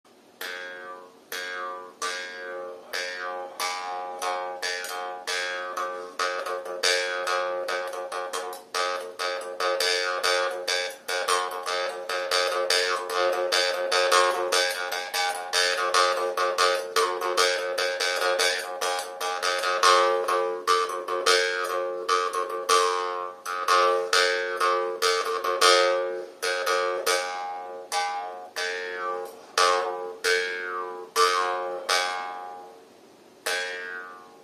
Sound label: Jews Harp; ساز زنبورک